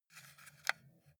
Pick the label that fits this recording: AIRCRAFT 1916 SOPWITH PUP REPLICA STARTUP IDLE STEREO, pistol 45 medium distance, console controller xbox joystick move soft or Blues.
console controller xbox joystick move soft